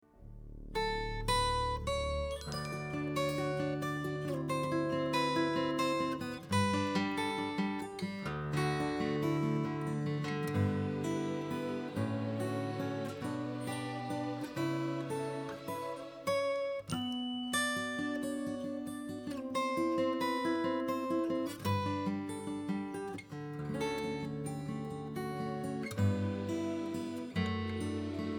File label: Mandolin